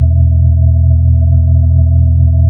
B-3 Chorus

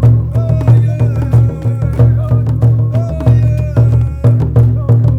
RAINDANCE; Spectrasonics; Heart of Africa 2

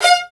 string attack, arco marcato; Roland LCDP13 String Sections; STR Combos 1; CMB Arco Marcato

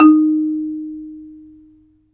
MARIMBA; ILIO

